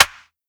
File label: snare clapper; Hip-Hop; Drums; Drum One Shots; Slate; CAICOS Hip-Hop Sample Pack